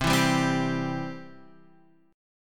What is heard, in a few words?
C Major, C, chord